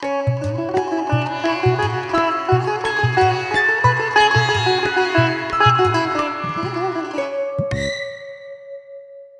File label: Bari Sax